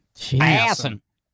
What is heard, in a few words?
bomb